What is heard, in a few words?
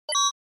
Accent